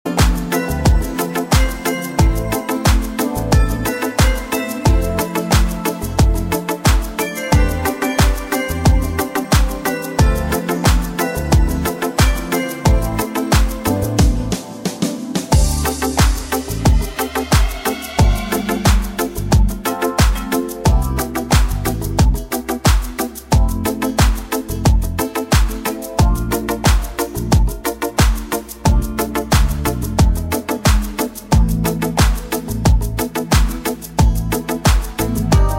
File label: cumbia